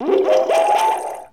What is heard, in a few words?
gain heart; SpongeBob Diner Dash